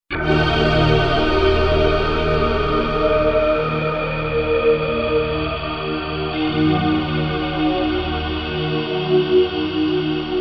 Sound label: Morpheus Atmospheres